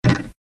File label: REELSET STOP; Lucky Ladys Charm